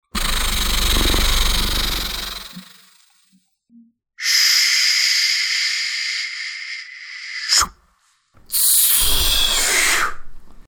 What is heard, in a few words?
transitions, Sound FX; Crowdsourced Sample Pack